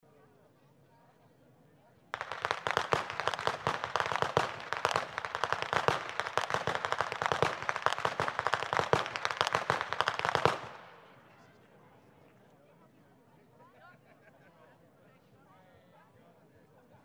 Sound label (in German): schnalzen, jugend; waging